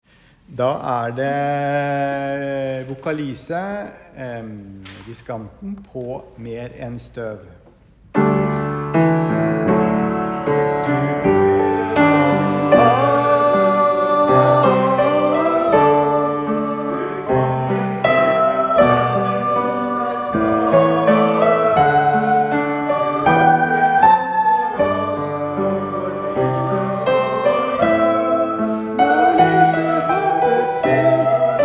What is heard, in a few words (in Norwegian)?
diskant; mer enn støv; kirkekoret; salmekveld